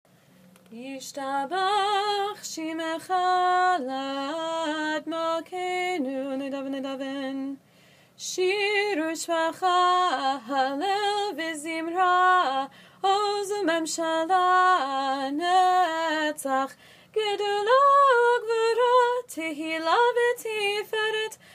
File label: Yishtabach with a tune